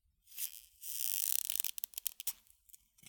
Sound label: Door Or Floor Squeak